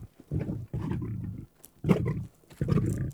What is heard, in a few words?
Wolf Growl Run; Animals